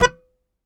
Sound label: Very Short Note, Melodica